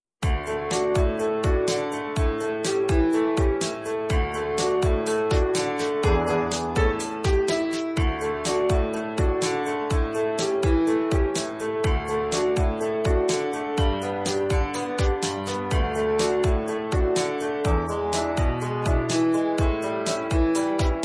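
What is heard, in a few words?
Fiddle Fiesta accompaniment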